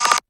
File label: landmine beep; freak fortress 2; employee